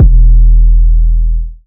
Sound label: 808's